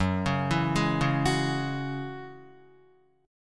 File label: F sharp 7th Suspended 2nd F sharp down Guitar; F#7sus2 arpeggiated